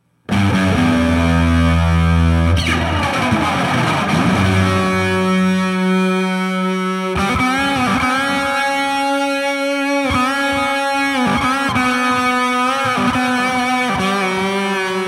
Sunface 12 oclock setting, Sunface with gain at 50%, playing solo.; Sunface Sound Clip 4